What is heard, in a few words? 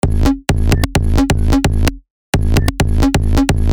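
Hardcore Kick Industrial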